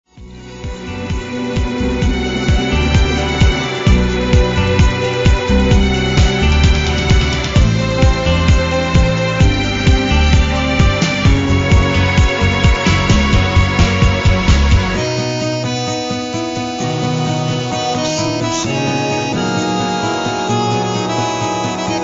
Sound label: tango